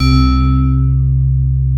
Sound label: C2, SD1 BELLS; MY DESIRE; East West - Global Sounds